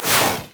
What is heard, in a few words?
carpet bomb; rumble